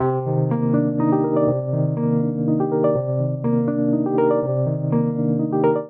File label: Vamp Arpeggiated 3/4 C Minor 122 bpm, Electric Keyboards; Ableton; Factory Packs